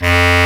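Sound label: Bass Clar mf F1; Digital Sound Factory Virtuoso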